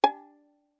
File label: Violin pizz ff sul A Ab5; MIS Pitches - 2014; Strings